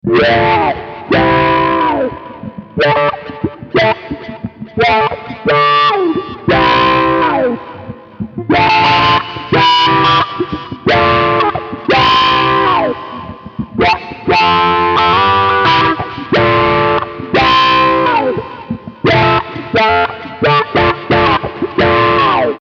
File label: LPF Clean